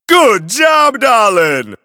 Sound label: grooves positive; tf; a hat in time